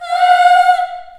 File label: F4 CHS EH, CHILD EHS, Choir; Acoustic & Synth; AKAI; USB Soundscan vol.28